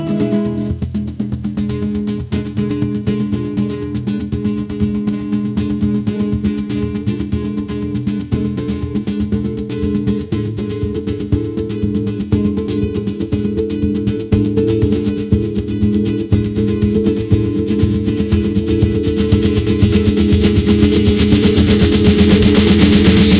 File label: guitar flamenco